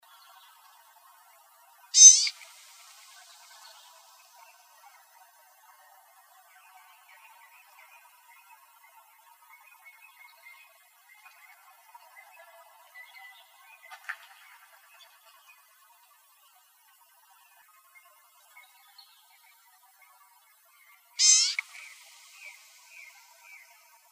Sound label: CALLS, Gnatcatchers; deviche